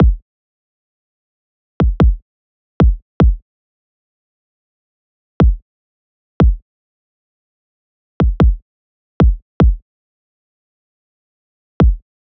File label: kick loop 75; Pop; Drums; Loops; Slate; SD PASADENA Pop Sample Pack